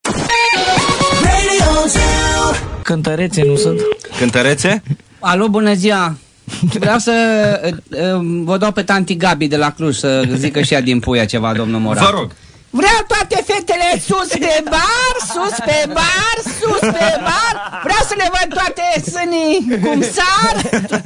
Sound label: karaoke; Buzdu; morning zu